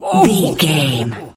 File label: voice male laugh short